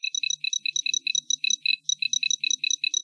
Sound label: scr search, computer; federation